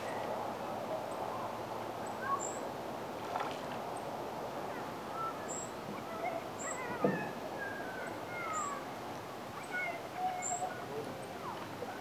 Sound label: at lake